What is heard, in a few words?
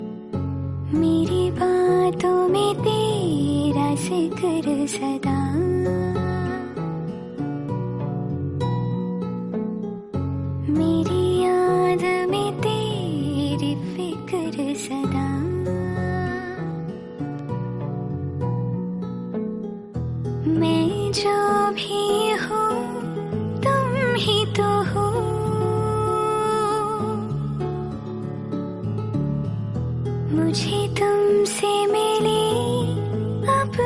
Female Version Song; Aashiqui 2